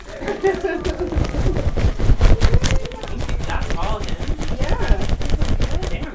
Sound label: laughing crunching